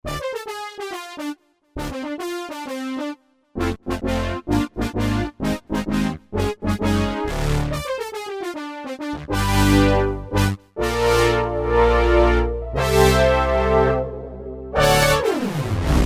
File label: Poly Brass; Roland JV-2080